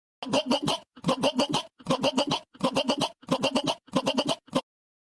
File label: gulp; goofy meme